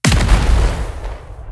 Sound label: robot fire